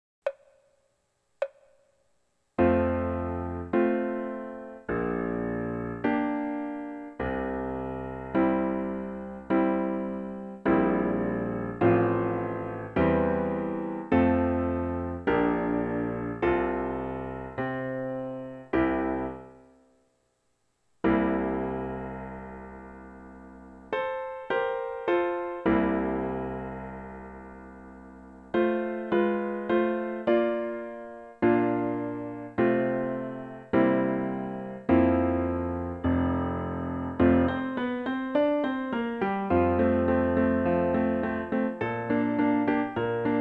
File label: romanze f-dur; beethoven